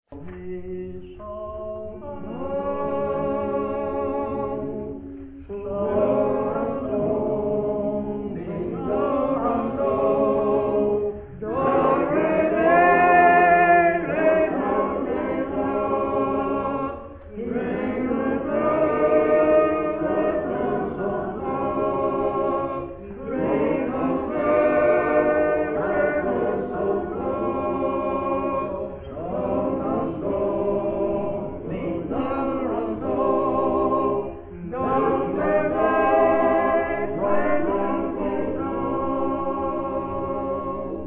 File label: rock of ages choctaw singing; Hymns